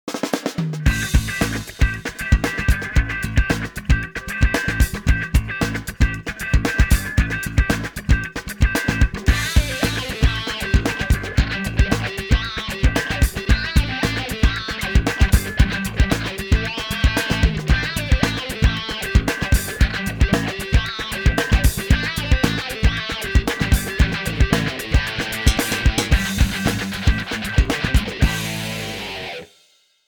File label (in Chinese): 激情的背景音乐